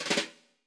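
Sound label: brush snare; Greytsounds; Scott Peers Sound Engineering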